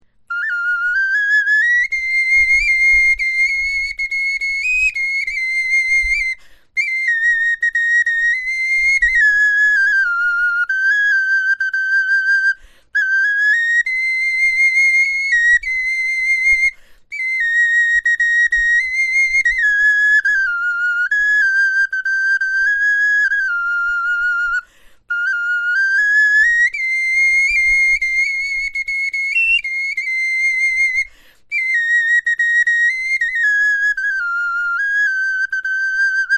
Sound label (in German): melodie, knochenfloeten